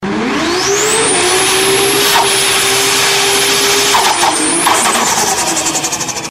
Turbo Wastegate

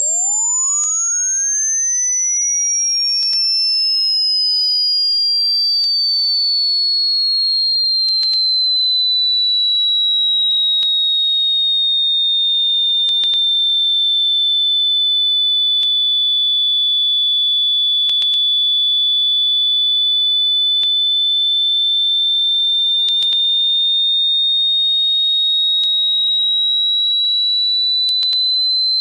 Fluit

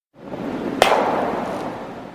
Curling Rock Hit

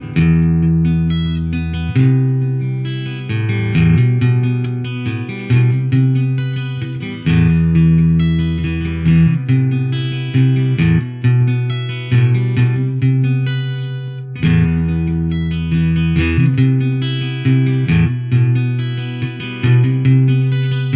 ALARM

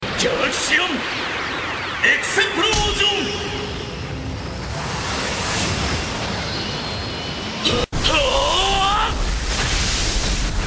Explosion; Galaxian

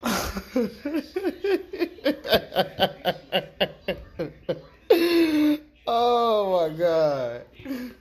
Laughing

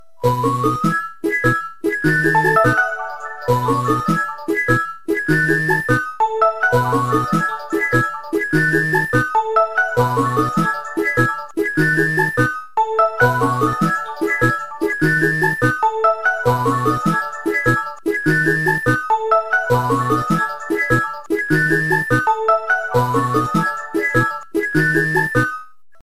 Clock Alert

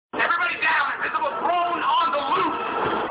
Invisible Drone

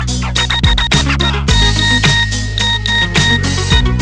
Nokia Sms Short

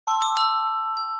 Wind Chime

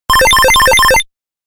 iihaa 8bit Phone

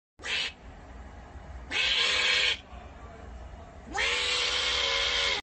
Screaming Opossum

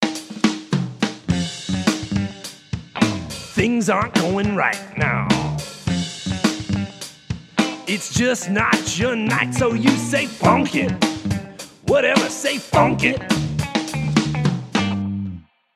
Funk

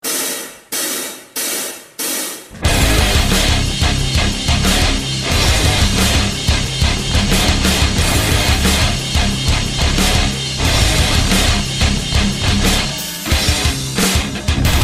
Rock

